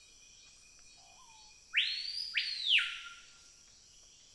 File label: screaming piha song